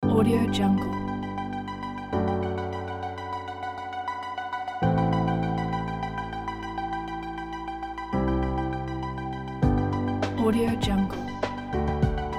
electro inspirational pack

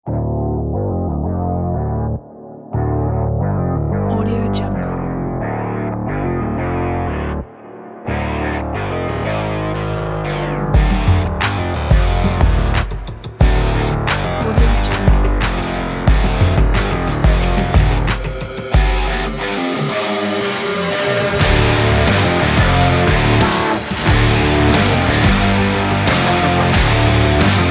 aggressive sport commercial

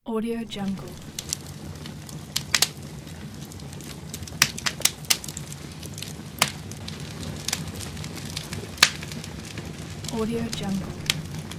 fire

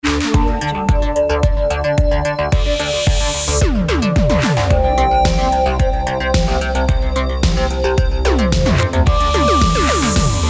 80s future logo

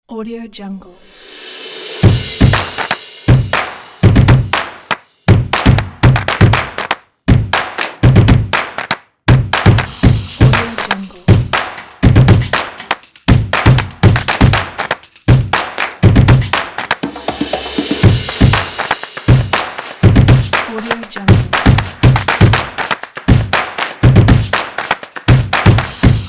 percussion

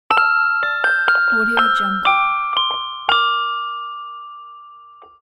music box

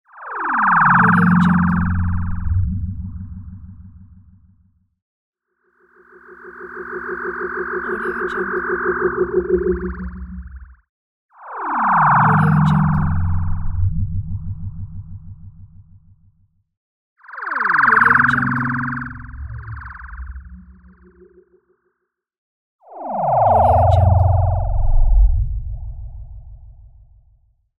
transition

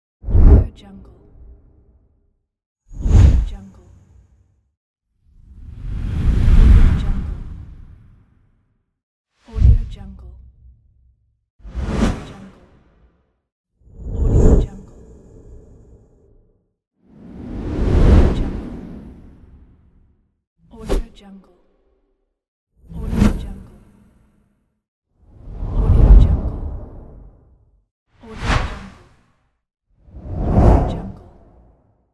whoosh

